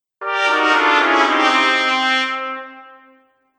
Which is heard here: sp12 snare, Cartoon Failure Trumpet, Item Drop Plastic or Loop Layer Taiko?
Cartoon Failure Trumpet